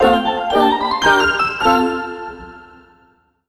Mission Complete! Fanfare; pok-mon sleep mobile gamerip 2023

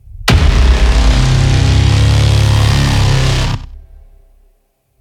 Hit; Monitor

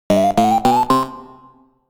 Start; Pac-Man Championship Edition 2 Plus

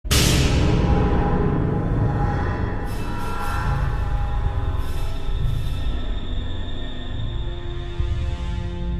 SHOCK; F.E.A.R. Perseus Mandate

